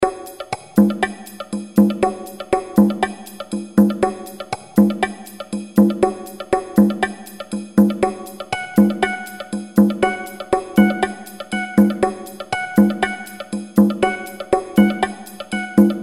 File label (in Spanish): ritmo